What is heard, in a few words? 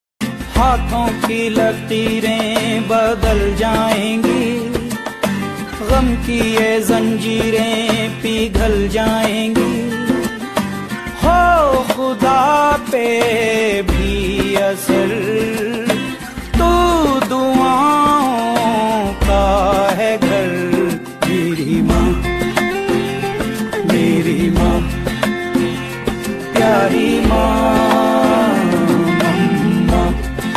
Ringtone